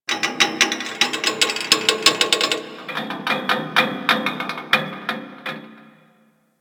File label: Ocean Medium Metal Creaks; Warframe; Grineer Ocean